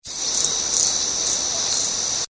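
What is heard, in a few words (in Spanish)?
voz picaflor común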